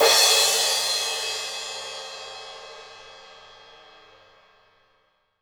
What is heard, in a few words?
clash, Cymbals Electro